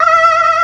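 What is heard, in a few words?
Chamberlain voice; AKAI S6000 CD-ROM - Volume 1; SYNTHESIZER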